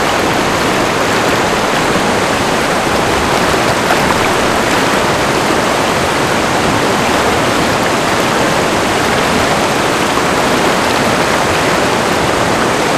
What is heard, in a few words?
lagoon waterfall top; Titanfall 2